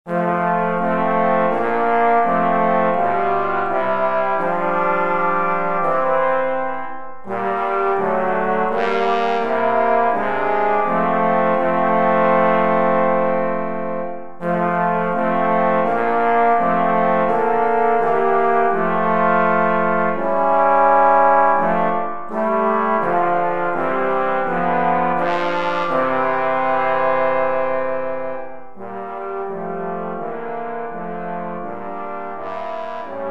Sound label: trombone trio